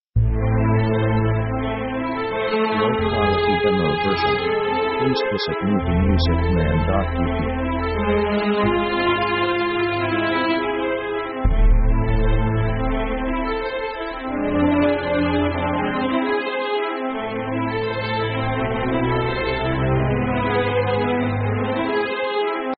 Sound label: orchestra dramatic arp; orchestra themes